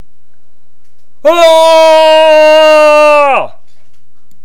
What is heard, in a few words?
scream